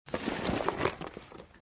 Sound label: get up